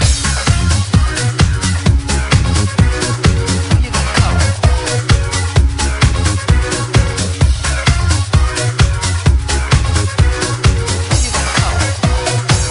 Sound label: random loop